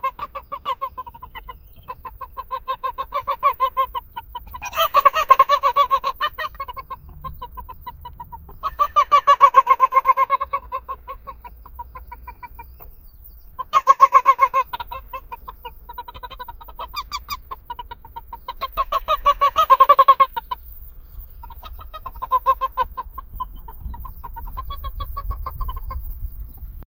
bruit de poules dans un, farm

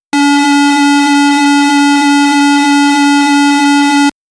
dans un vaisseau spatial, computer

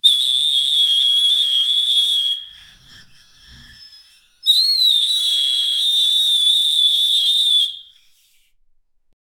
crie aigu, dinosaur

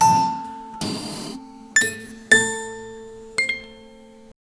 platine de dj

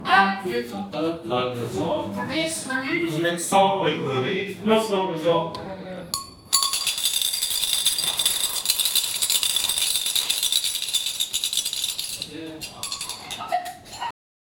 salle de cours en France; classroom

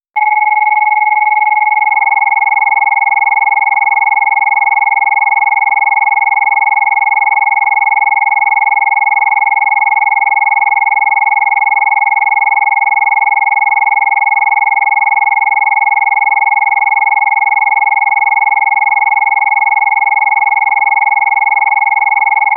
un son dalerte complex av, japanese anime

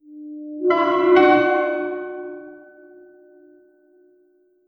symbolise une victoire, epic

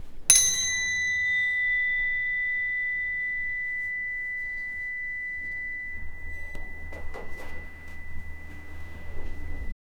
fin de la journe de, factory

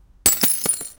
bruit léger de cassage, mining

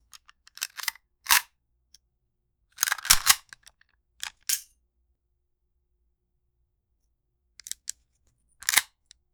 le bruit denlever un char, magazine

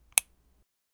bruit de clic, button

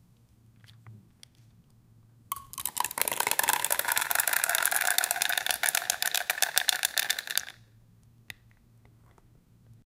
mousse qui sort de son, whipped cream

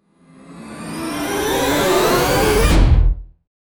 crer un son de level, level up

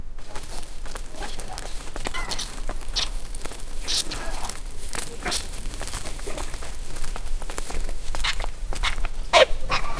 crocodile marchant dans, reptile

